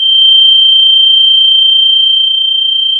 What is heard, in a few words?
Sound of a tea kettle whistling